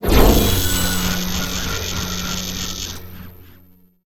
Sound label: mine; Earth and Beyond; EA Games